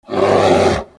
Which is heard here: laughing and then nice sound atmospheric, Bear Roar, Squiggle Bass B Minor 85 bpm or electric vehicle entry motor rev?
Bear Roar